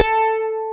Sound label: DIGITAL BASS, BassGuitar